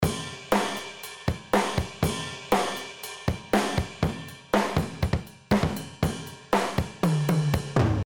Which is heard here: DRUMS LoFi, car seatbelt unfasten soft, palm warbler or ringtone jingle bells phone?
DRUMS LoFi